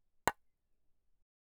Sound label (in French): Chpok couvercle bocal; Bruitages